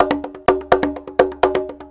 PERC LOOP ETHNO 125

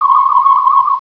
Ground Tricorder; Star Trek JR Audio

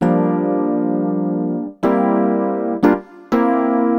Synt; FUNKIN&GROOVIN'

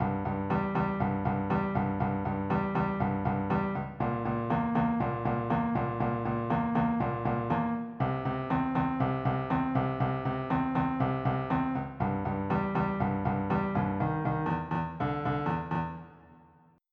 new wave

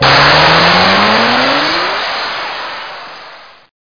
fly

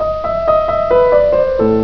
Lightning Storm; Win95

